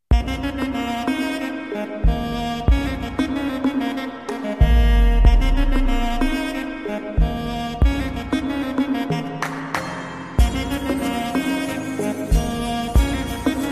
bgm ringtone lofi mix bgm ringtone love ringtone new bgm